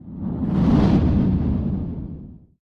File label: Lo-fi transition low passer by whoosh